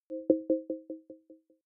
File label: button echo chord